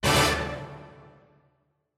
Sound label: Quiz Question